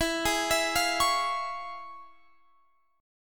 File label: F Minor Major 7th Sharp 5th, E